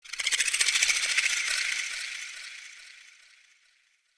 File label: Snake Rattle; Ambience; RedNight